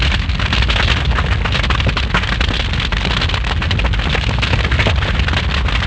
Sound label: ship on fire; shipcharge; Pirates Of The Caribbean